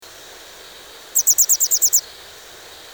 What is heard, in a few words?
Motacilla cinerea